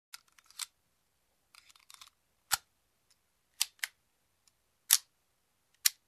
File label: Reloading pistol